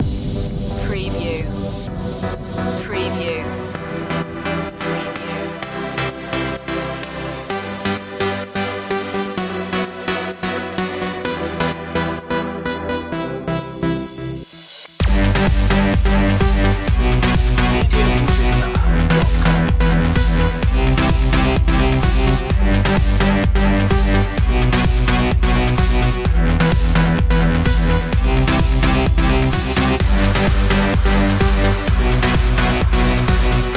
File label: trance